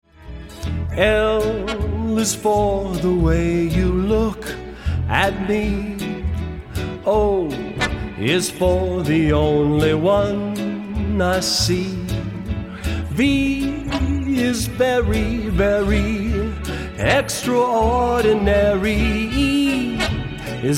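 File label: live with horns; Jazz Band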